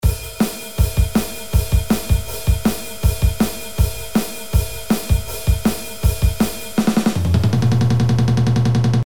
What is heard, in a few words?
Hard Rock 70